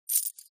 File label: merge coins